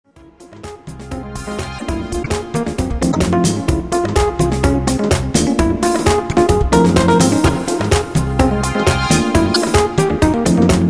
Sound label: Jazz; Whats Jazz